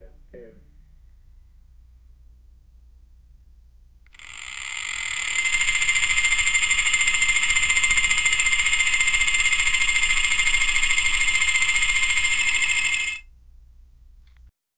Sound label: a toy top spinning and; toys